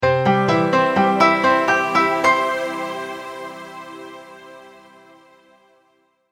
multimedia logo, Musical Identity/Signature

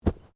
Bodymovement Fall Light; Body movement foley to simulate falls and deaths; Wrestling And Struggling

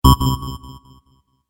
Sc-Fi Generic Game SFX; Tech; Tech Sound Effects; Tech (Sound Effects:Science Fiction); Stuart Duffield

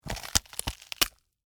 Crunch Green Pepper, Rip, Open, Vegetable; Texture

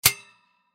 Air Vent Cover Close Metal; Household

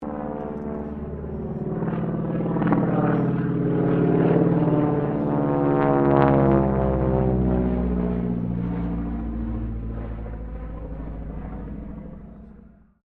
AIRCRAFT AT-6D HARVARD MK III PASS; Harvard Training Aricraft; Airplanes; Vehicles

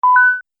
Multimedia Event Notification, beep sound effect; Andrew Fleming

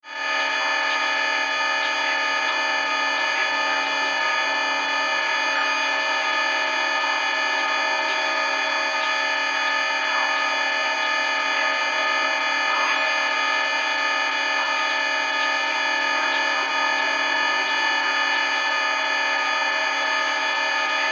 Communication, Malfunction, Alarms, Scifi

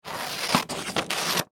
Office Scissors Cut Paper Heavy Stock; Sound Effects:Offices

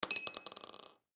Foley Pill Drop In Glass Medicine; Drop Sound Effects; Drops (Sound Effects:Objects); SFX Bible 6

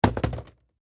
Cardboard Box Dropped Foley Small Package; Miscellaneous Impact; Impacts; BBFX

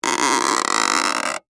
Stretch Bend, Cartoon, Stretch, Bend, Wood, Creak; Cartoon Sound Design; BlastWave

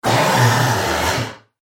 Dinosaur Epic Lizard Monster

